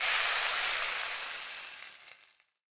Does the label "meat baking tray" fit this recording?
Yes